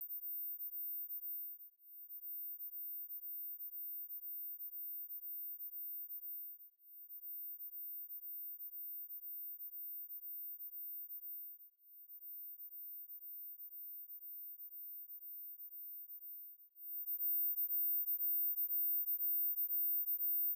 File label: mosquito